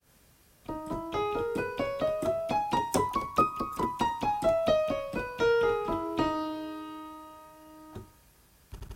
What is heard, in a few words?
Piano Run